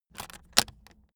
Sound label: aluminium case close buckle